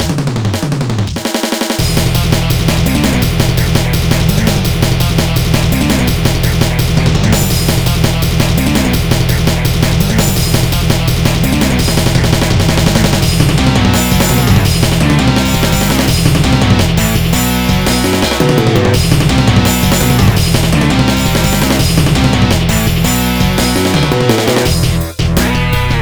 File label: Block BGM; 1500 DS Spirits Vol. 03 Block Kuzushi